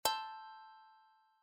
chord

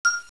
close order

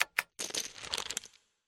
home Button

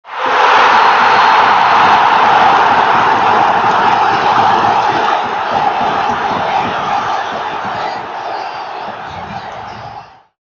goal; stadium

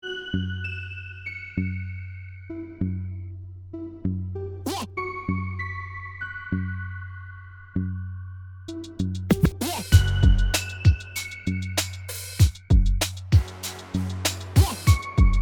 arp

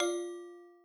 reward

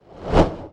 wheel win screen disappear; golden wheel; City Verse Tycoon

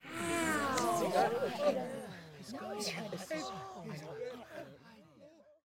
crowd Chatter guild; spellbound

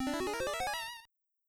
appear

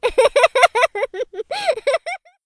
character laugh; plumber soda

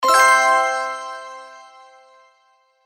Win

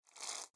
shuffle; briscolapiu

